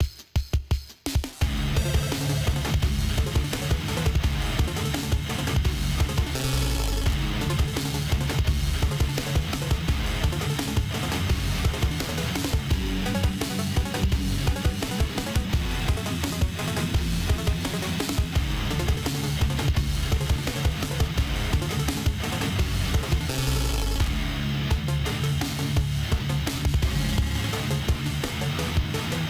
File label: Music Metal